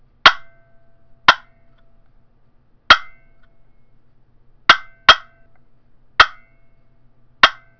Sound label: poplar purpleheart clucks, turkey; Southland Sounds